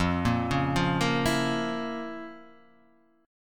F Major 7th Suspended 4th Sharp 5th F

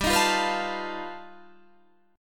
G Augmented 9th, Guitar, Standard; G+9 strummed; JGuitar

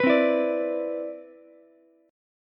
C Major 9th, Ukulele, C6; strummed; CM9

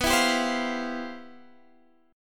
C Major 11th B

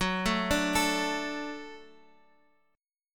F sharp Minor Sharp 5th, F sharp, chord; chordshape; down

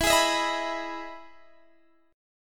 E Major 7th E down Guitar DADGAD

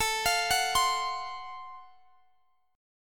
Gb Minor Major 7th Flat 5th, chord

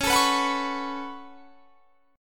Db 7th Suspended 4th Sharp 5th Db down; Db7sus4#5 strummed

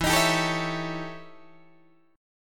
E Minor Major 13th E Guitar DADGAD

